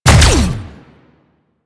Conduit Fire; Sentient

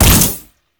Layer Shot, Quill Dart Gun; Hystrix Prime

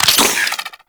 Syringe Antidote Pickup; Missions; Raid; Golem Trials; Infested

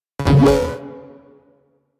Rifle Projectile Pre Explosion; Weapons; Warframe; Corpus; Crp Ruban Rifle

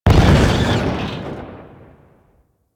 Destruction Explosions One; Ambience; Warframe; Corpus Railjack

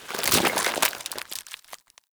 Root Spike Impale End; Powers; Bromius

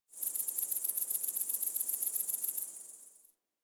Tree Crickets; Warframe; Ambience; Nineteen Ninety Nine

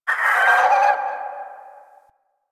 Landscape Cave Creature Calls, Ambience; Warframe; Venus Open World; One Offs

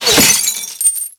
Glass Impact

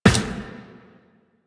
Run Glass, Footsteps; Warframe; Tenno

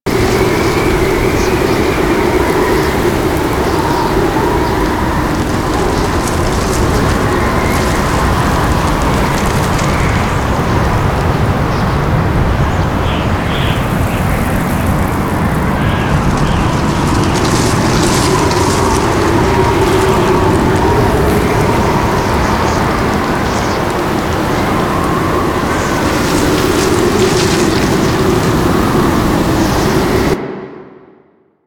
Settlement Sandstorm Loop

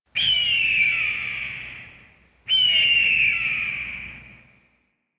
Ambient Bird Calls; Warframe; Duviri

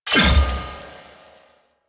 Shield Armor Ability End; Warframe; Helminth Abilities

